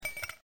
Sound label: ICE